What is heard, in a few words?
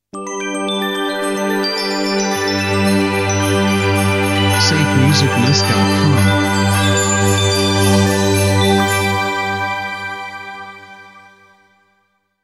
Short Christmas Intro